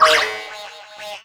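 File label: RUBBER BAND, anime sound fx, comic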